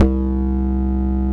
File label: BASS, Drum & Bass, 160 BPM; Ueberschall; Drum & Bass Flash Trackz